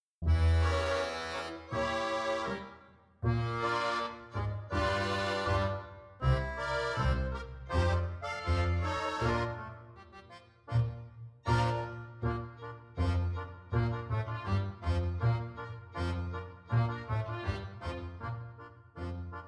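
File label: piano karaoke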